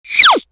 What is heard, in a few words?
cartoon